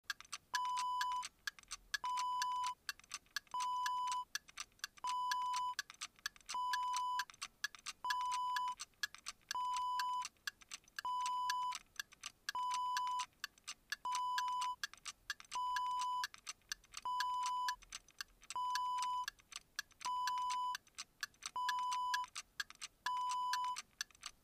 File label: clock ticking